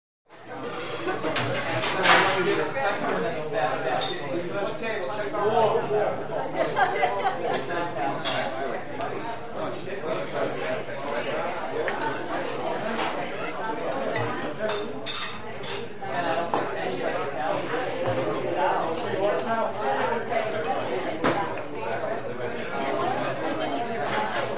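Restaurant Walla; Coffee Shop, Mixed Walla Close To Medium With Loud Dish, Glass, And Silverware Movement And Set Downs. Medium Perspective Cash Register.; restaurants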